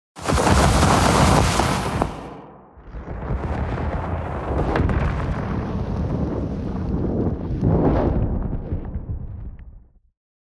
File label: fire